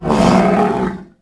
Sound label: Call, Dino; Toro